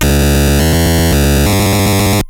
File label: die, ATARI